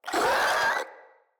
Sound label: Infested Charger Pain, Enemies